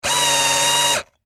Industry Electric Drill Tighten Screw Whine Stress Short; Drills